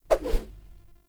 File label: Grunt Foley Spin Whoosh; Enemy; Characters; Corrupted Sproutfolk